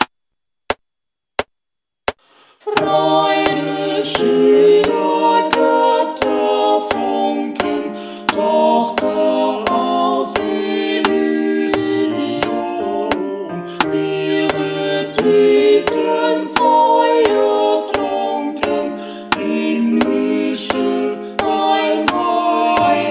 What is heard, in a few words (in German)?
Ode an die Freude klassisch chor; Bass (Chor); DER GRÖSSTE CHOR IM WESTEN